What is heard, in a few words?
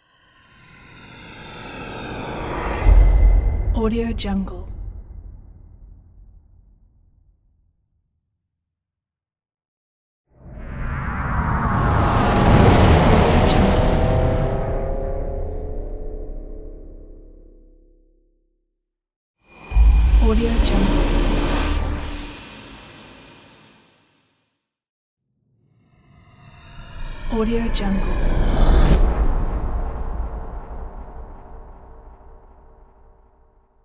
futuristic passing by; futuristic passing by pack; infinity sfx productions